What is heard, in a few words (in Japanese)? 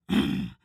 攻撃